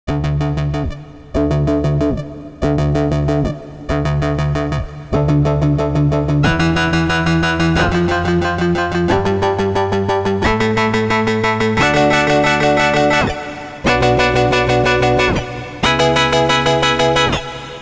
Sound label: rhythm zone seq; the digital life